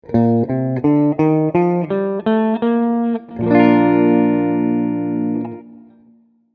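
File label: B flat major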